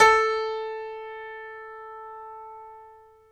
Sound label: PNO, Grand Pno, Roland; Club-50 - Foundations; Grand Pno 1